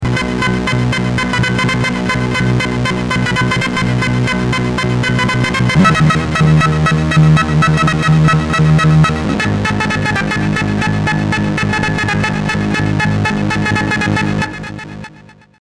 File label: arp 2600 sequence